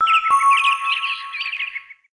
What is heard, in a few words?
Alarm Ding Dong; LG A255 Personal